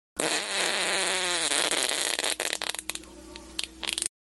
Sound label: fart